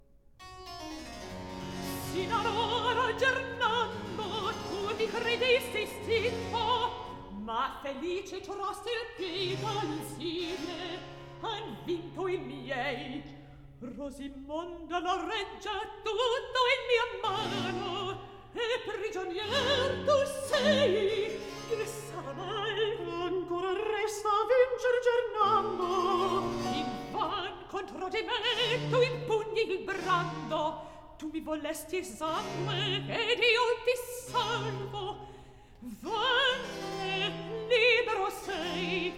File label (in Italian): Recitativo; Sino ad ora; Handel Masterworks; Act I